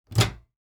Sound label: Microwave Door Open; Appliances